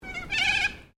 Bird Cockatoo Sulpher Crested Call, Australian Cockatoos; Birds (Sound Effects:Animals)